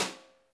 Chuck Brush; Digital Sound Factory

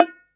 Bongo H; EMU Orbit-3

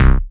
BASS Kryogen, bass; M8; Electronisounds

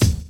DRUM CHOP; AMG Gota Yashiki Groove Activator

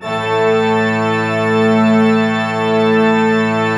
A2, Cathedral Organ

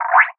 Synth perc; musicradar; cartoon caper

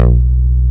X BASS; East West; Global Sounds

